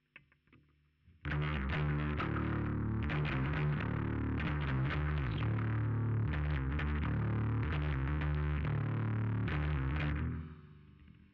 Bass Sansamp

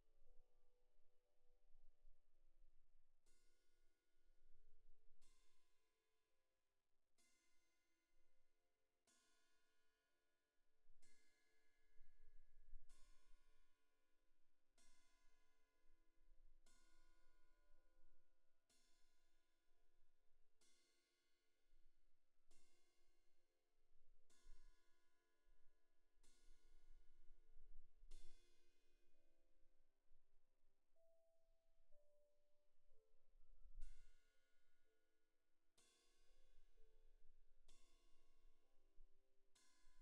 Cymb2 Main

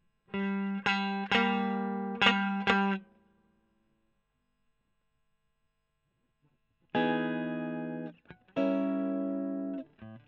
E.GTR; Gefell

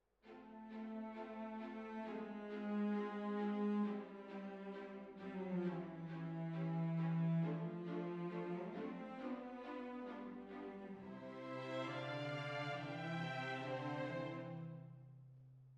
Hall, Jazz for piano

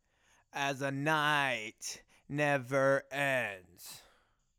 Chorus

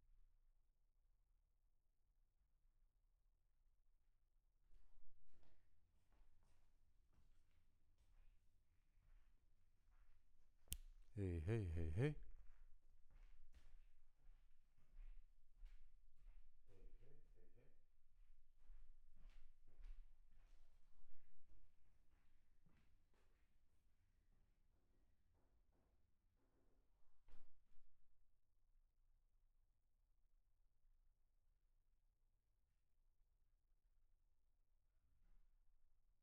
VOC GUIDE

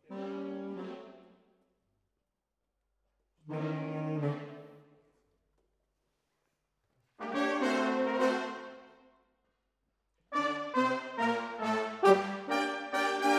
Horns

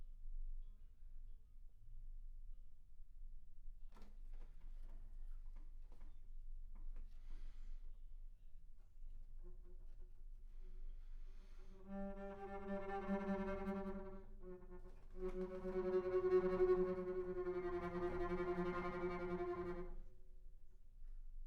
Bass Room

